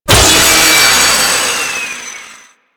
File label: Saw Impact Default, Blade Impacts; Warframe; Grineer